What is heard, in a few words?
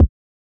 Kick, techno drum